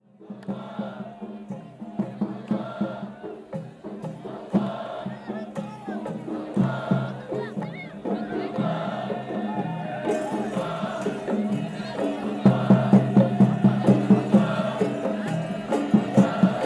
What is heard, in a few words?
Sudani Chant